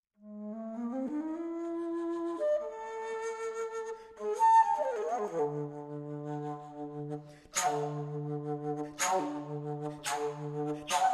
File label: wind